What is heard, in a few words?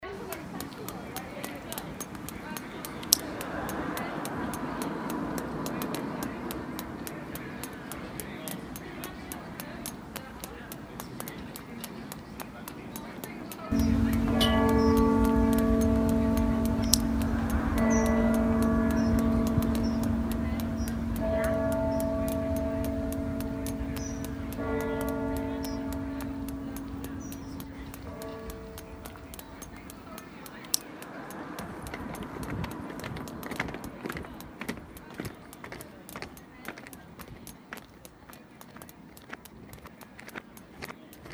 SOUNDSCAPE